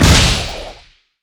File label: steam golem rocket grab; LoL; blitzcrank